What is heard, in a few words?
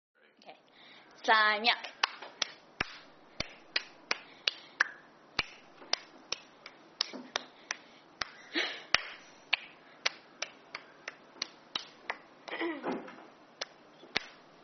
Clapping